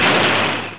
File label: hit; netpanzer